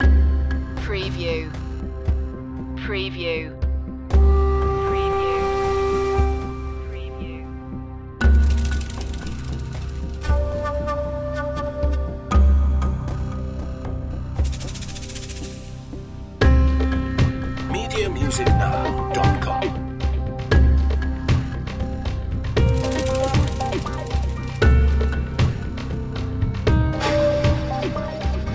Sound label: underworld series dramatic cue